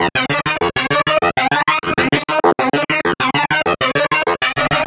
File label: Techno Effect Sect, Effected Horn Section; Sonic Foundry (Sony Creative Software); Jazz Solos and Sections